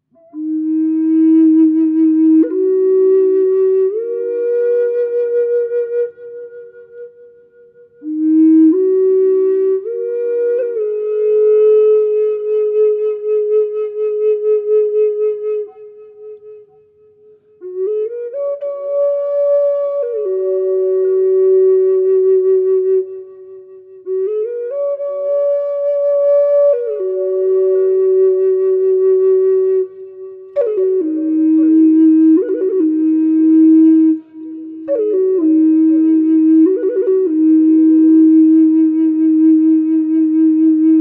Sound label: harmony